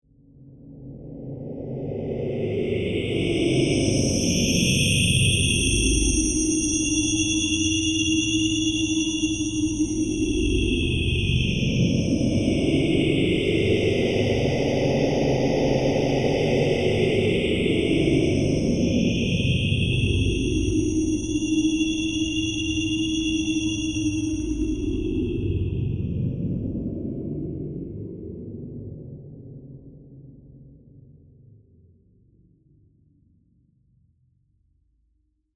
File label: Alien Evil Presence, Scifi, Alien, Evil, Presence, Movement, Science, Fiction; Blast Wave